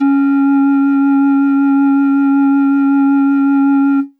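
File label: Pan Flute DX100 C#6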